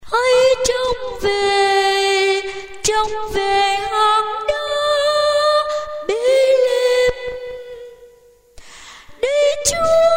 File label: tenor